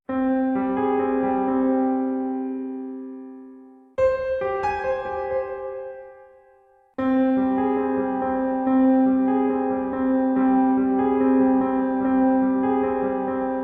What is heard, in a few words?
Classic Horror Intro; Ghostory